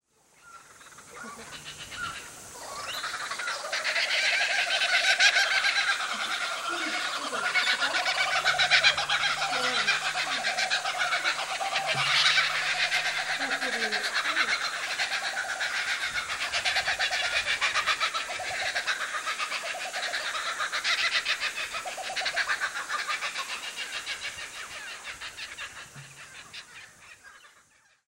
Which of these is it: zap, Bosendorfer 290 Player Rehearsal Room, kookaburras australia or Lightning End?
kookaburras australia